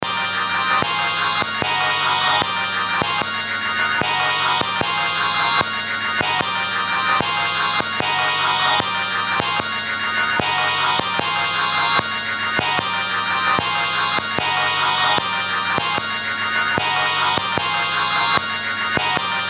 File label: wersi